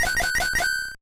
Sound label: Hurry Up!; bomberman tournament gba; Battle Mode